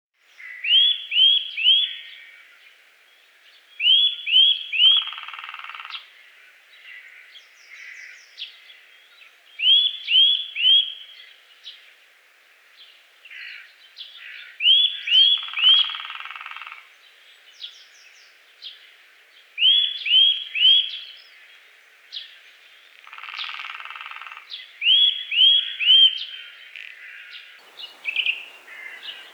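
obyknovennyy popolzen golos, Eurasian nuthatch voice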